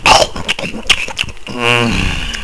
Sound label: chomp; SCS Snake